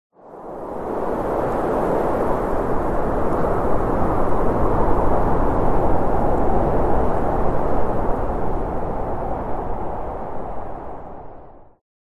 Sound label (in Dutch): Harde wind; ZhenShiXunYouJi2